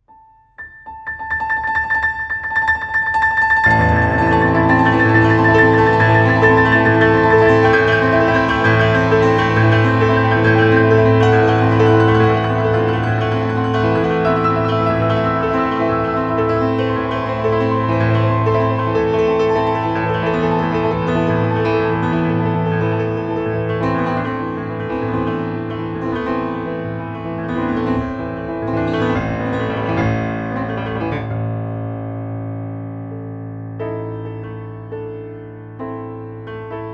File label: Improvisation